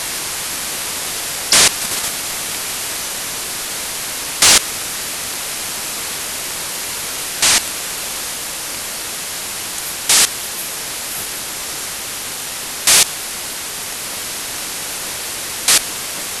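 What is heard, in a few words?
Hoopoe, satellite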